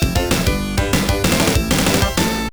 Game Set, Game Over; Zero Wing; genesis gamerip

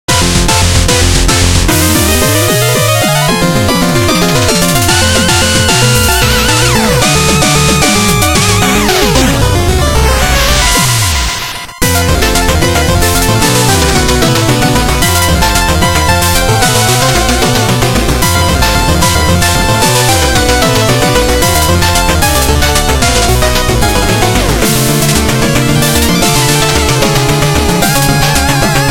Music Boss; Boss Treasure; Shovel Knight King of Cards

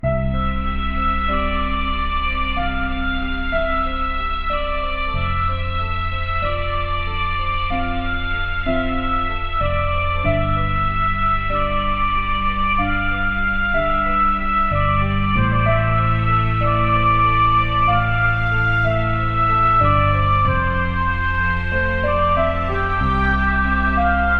MENU PAUSE, gamerip; Scribblenauts Unlimited

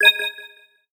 Receive Item

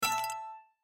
Chip Destroying; my cute commissar 2020 gamerip

